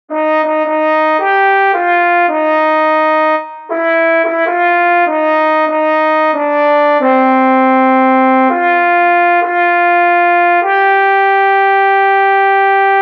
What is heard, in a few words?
Tenor, barbershop tags